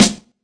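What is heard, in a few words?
classic hip hop snare; Classic Hip Hop Mega Kit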